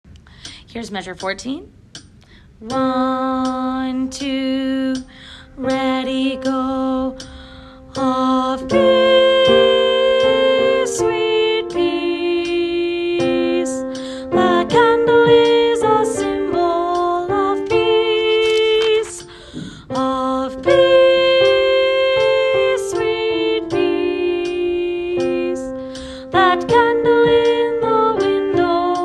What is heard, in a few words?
candle is a symbol soprano